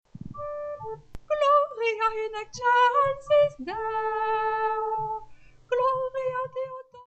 Gloria Soprano

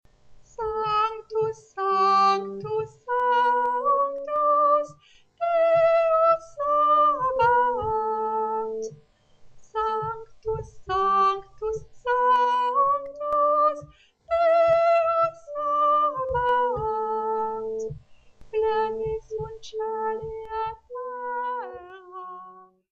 Sanctus Tenor

